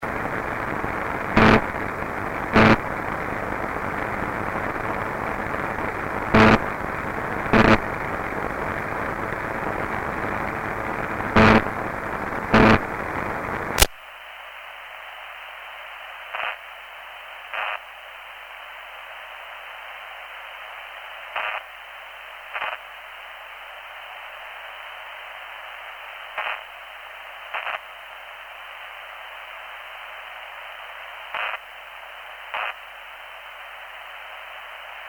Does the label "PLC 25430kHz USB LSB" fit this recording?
Yes